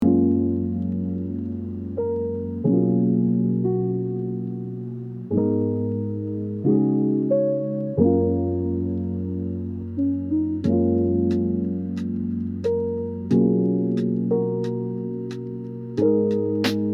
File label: Soft pop beat positive vibes